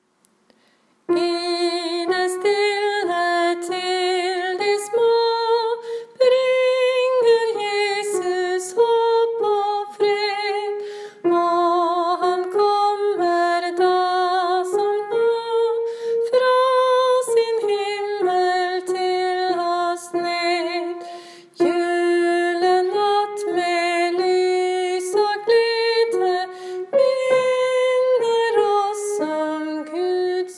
guttestemme; i en natt; åfjord

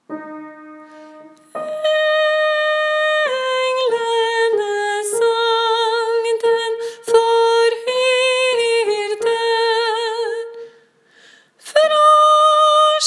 sopran; deilig-er-jorden; åfjord

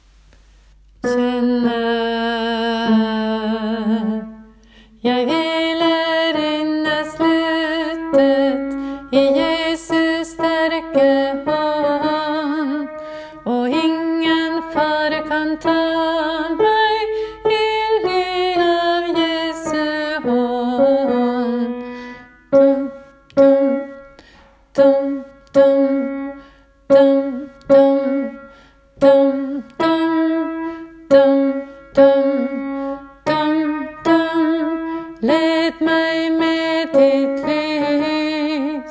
ingen er så trygg alt midtdel; åfjord

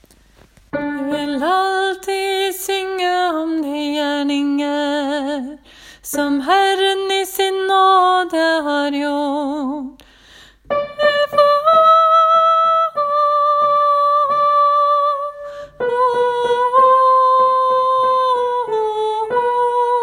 tenor; åfjord

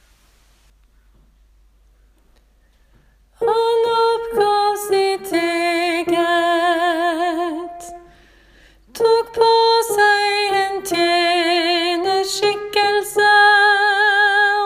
kristushymnen tenor bass; åfjord

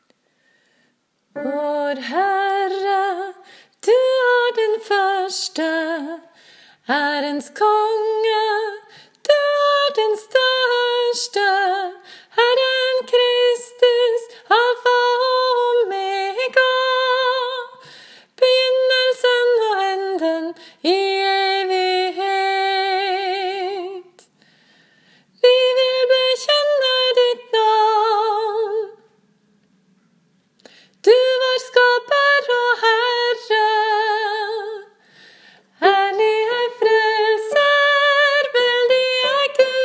bass2; alfa og omega; åfjord

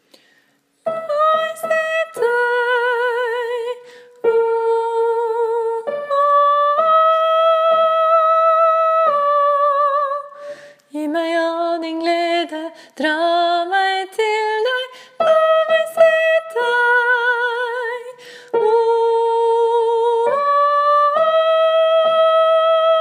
la meg se deg tenor; åfjord

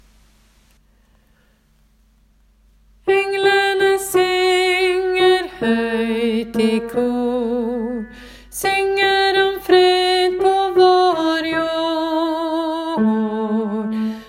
bass; en-stjerne; åfjord

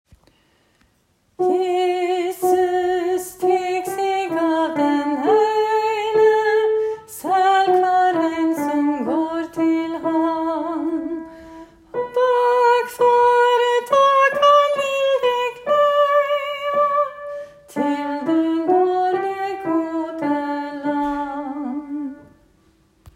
sopran; jesus tek seg av den eine; åfjord

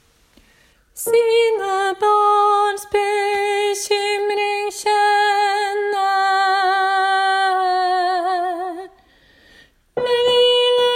tenor; ingen-er-sa-trygg midtdel; åfjord

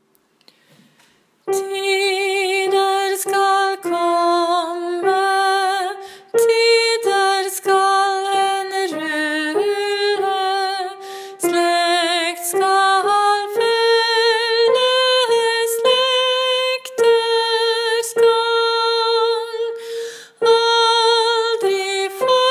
deilig er jorden tenor; åfjord

